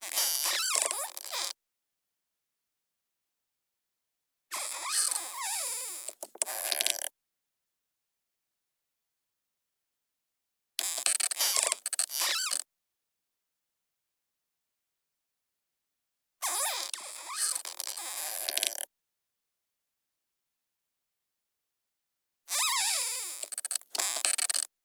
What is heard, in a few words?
SQUEAKS AND CREAKS Hardwood Floor Creaks Long; Squeaks and Creaks v2; GDC Game Audio Bundle